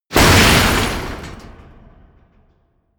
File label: smash, baugewerbe